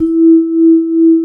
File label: VIBRAPHONE; ILIO